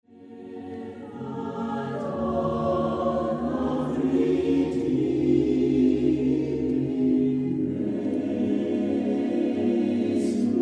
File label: Stille Nacht Silent Night